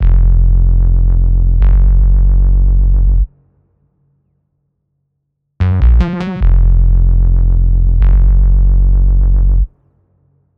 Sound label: Synth Bass A#m 150, Trap Loops; GhostHack; HipHop; Eerie